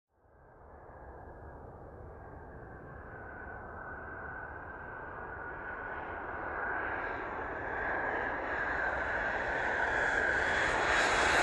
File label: Aircraft Ascending